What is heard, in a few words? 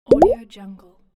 pops